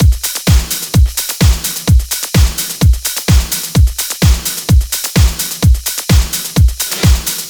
128BPM Classic Kit; VFH1 128BPM The Classic Kit

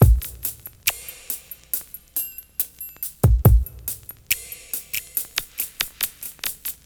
Slomo Beat 140 BPM, Loops, Slow Motion Beats

